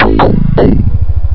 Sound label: synth bass; Venus